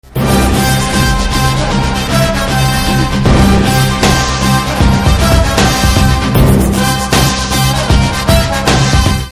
Trailer Theme Ringtone

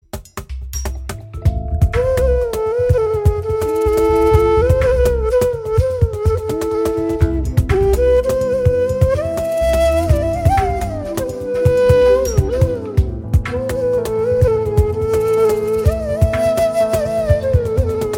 Flute BGM

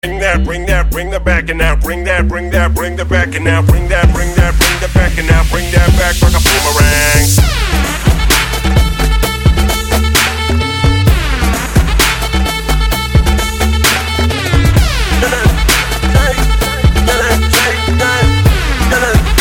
Ringtone

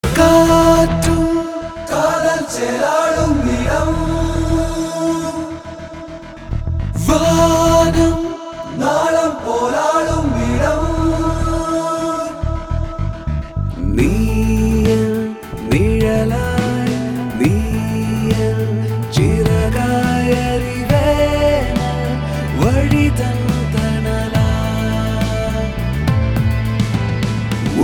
Love Bgm Ringtone

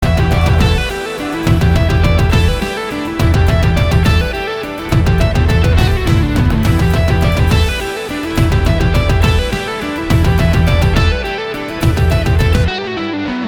Love Bgm

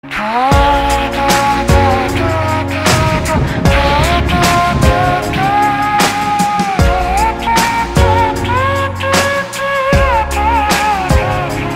Love Bgm

